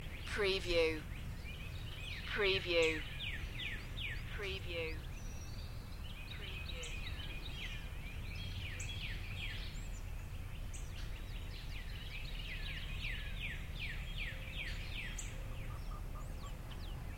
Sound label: Nashville Morning Ambience